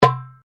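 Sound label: Drum Darabuka Edge mezzo forte, Egyptian Darabuka Drum; Commanly available Egyptian Darabuka Drum; Musical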